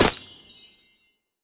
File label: Ice Shard Impact